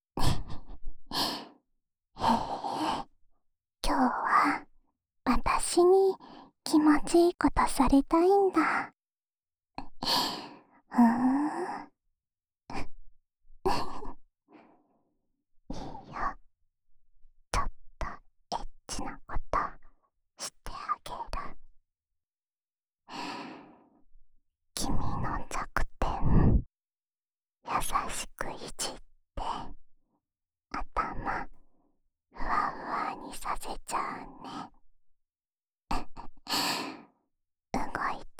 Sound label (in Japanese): お耳ふーふーループ（右耳）, ASMR; おま○こ係１